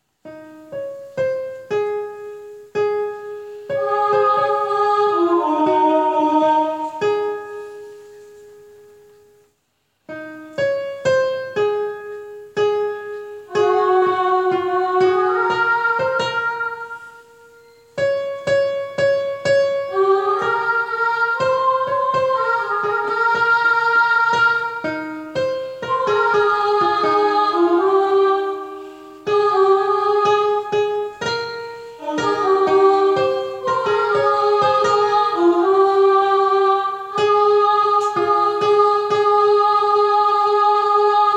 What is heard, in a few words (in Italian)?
contralti